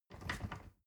ladder wood rattling

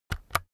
keyboard external key press slow

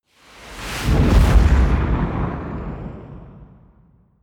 missile medium fire stationary distant

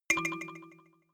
notification kalimba delay second

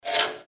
scratching

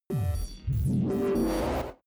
space searching computer hard

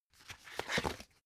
dvd case put in booklet

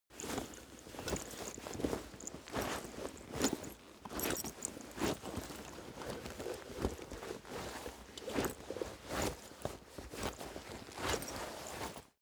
leather metal movement soft sequence

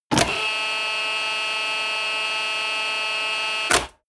fire truck ladder extend

